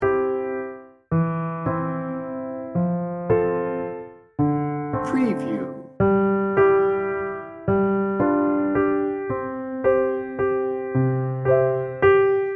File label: oh little town of bethlehem loop